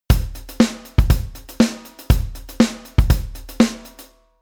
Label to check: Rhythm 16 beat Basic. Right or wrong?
Right